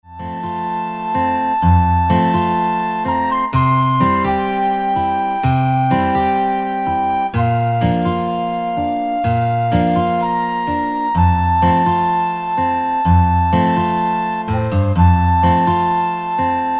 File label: hawaiian guitar